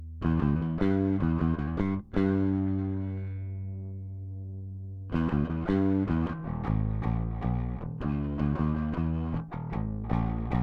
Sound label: low gain; FF Audiotechnik